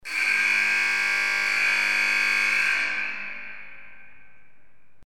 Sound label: Sports Buzzer